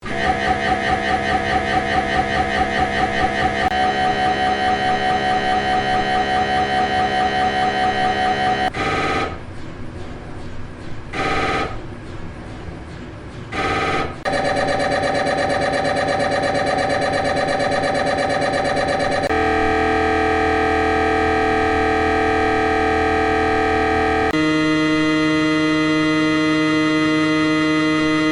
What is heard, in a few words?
mri sounds